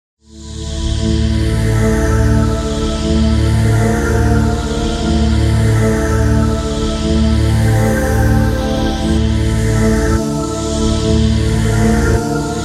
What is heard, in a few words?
vowelled soundscape